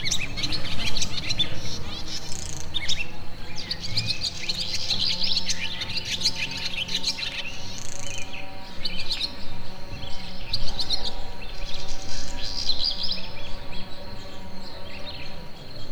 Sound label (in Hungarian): fűnyíró is, parti fecske; allathangok; termeszetben; egerszog